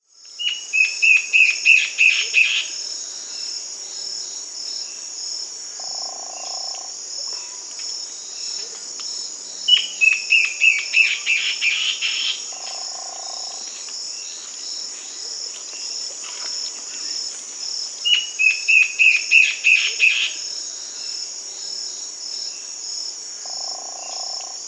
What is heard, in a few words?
Warbling Antbird (Hypocnemis cantator), Peru; S. America